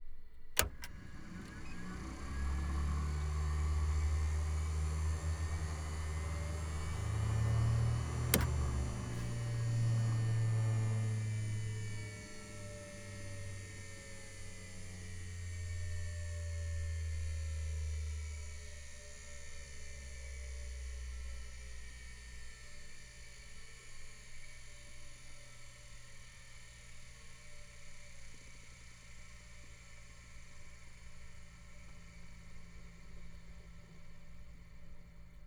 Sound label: Robinson, R44, Master Battery Button; Raven II 2004